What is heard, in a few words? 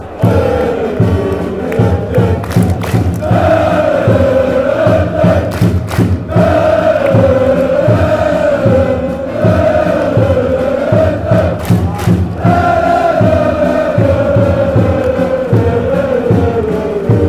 men harlech music, fanchants